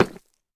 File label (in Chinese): 深板岩砖踩踏声